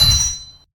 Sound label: hit